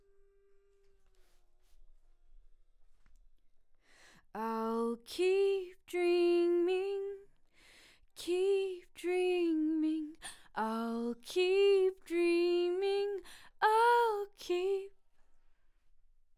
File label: BG Vox